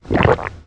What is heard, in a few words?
gulp